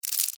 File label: Cockroach Scurry, Cockroach, Scurry, Short, Movement, Insect, Bug; Sound Effects:Animals:Insects; Cockroaches; Blastwave